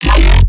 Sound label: bass hit aggro growl F; Virtual Riot